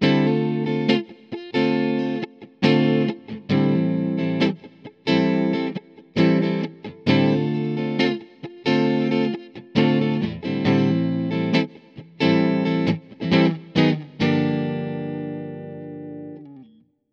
Seymour Duncan Splitted Neck Humbucker Clean; Tesla Pickups vs. Roswell

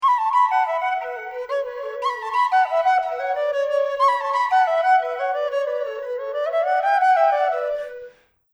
Flute Reverse

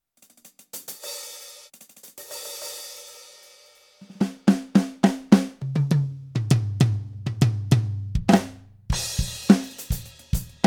PEARL eMERGE High Fidelity Audio

